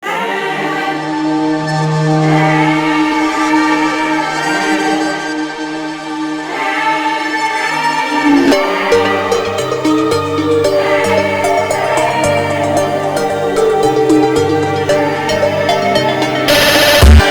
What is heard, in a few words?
Brazilian Phonk